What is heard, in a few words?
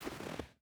footstep hide snow walk; Rust